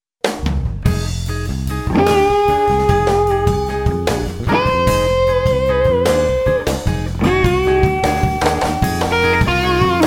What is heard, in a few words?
Fusion; SLX50 H535